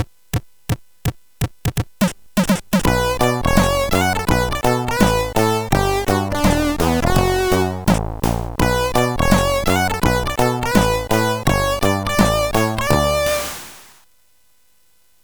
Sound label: Jingles; 2 Jingles